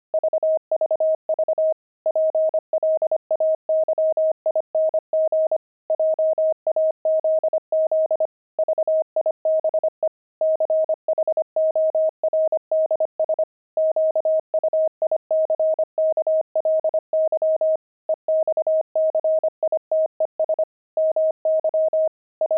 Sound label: pangram, intermediate pangrams files